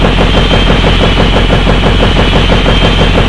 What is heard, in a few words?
chopper rotor, vehicles; hl2